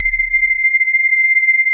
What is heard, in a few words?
sparkles